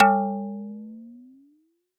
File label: Action Charged; Sound Ex Machina